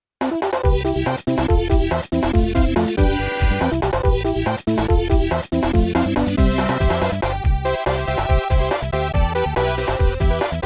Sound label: SNES Sound System; SNES Game Sound System